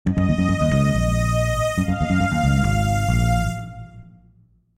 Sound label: Dial Piano Performance; Scarlet Hood and the Wicked Wood